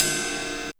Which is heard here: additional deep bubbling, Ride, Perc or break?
Ride